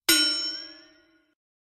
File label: t clang